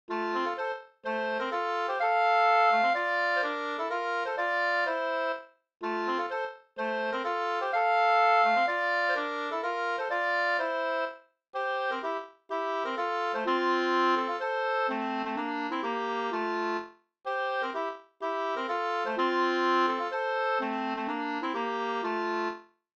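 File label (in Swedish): polska, tvåstämmig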